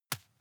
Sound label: juggle catch soft